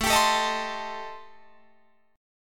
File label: A-Minor 7th Flat 5th, Guitar Drop D, chord